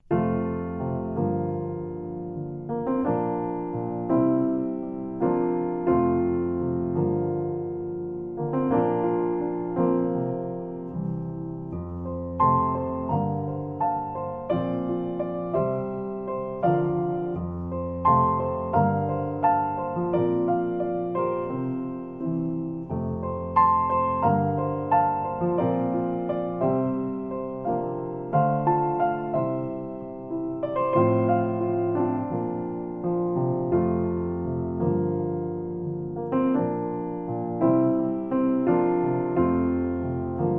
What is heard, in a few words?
Solo Piano